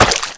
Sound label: blade hit, weapons; XDM